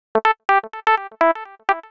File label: SYNTH VOCAL 125 A; Keys